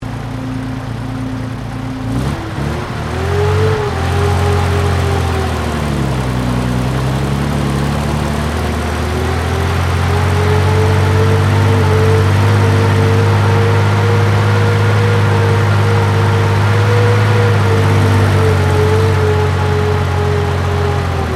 Tank Centurion Start Stop, British Centurian main battle tank; Trucks; Vehicles